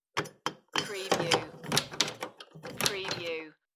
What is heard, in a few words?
Wooden Door Lock